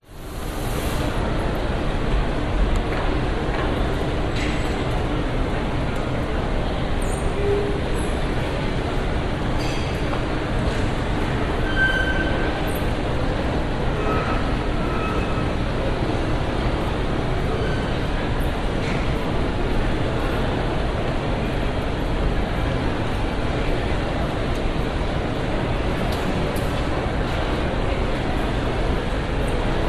public places mall interior near food court brisbane cbd